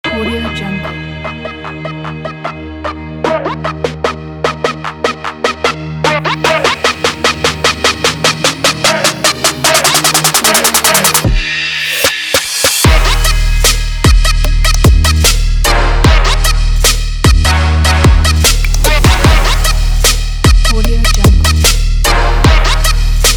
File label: trap